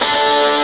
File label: guitar wild